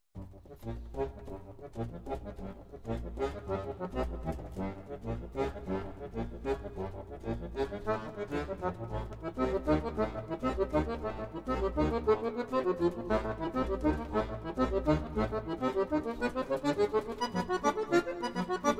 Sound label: toccata